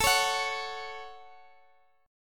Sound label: A 6th, Guitar, DADGAD